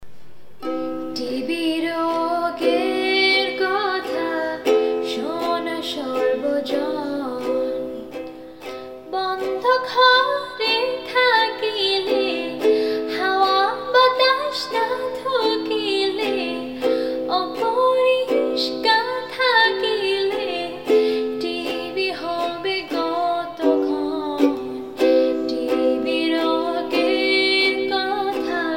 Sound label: TB song with ukelele